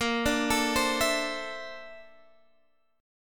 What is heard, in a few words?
A sharp Major Sharp 11th, Guitar, Open G